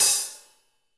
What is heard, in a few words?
HH, HH Kit St Rev